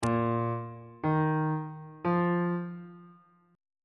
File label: b flat suspended 4th triad chord intervals on bass clef